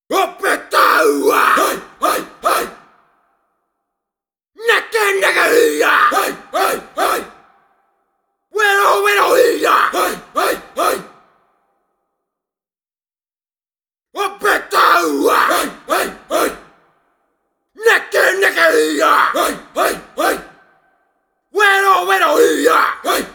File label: Haka